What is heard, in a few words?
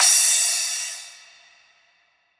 Crash; KSHMR